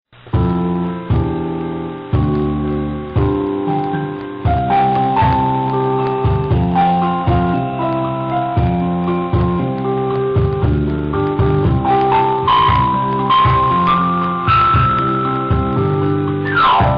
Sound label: future music